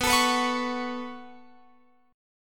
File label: B 7th Suspended 2nd, Guitar, Open F; B7sus2; strummed